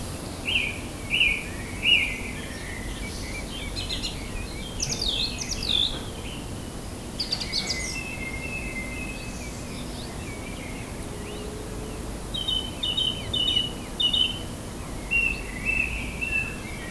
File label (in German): Singdrossel